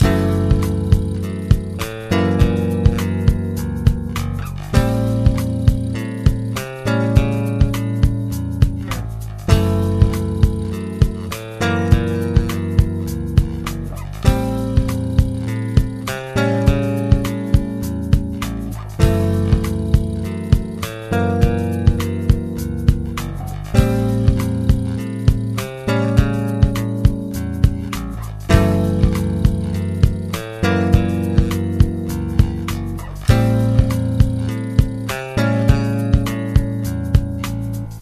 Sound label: happy groove